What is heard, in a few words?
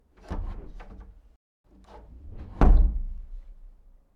Door Open Close Inside